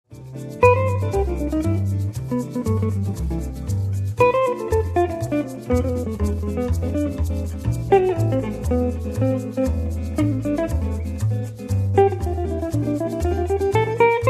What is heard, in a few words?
Samba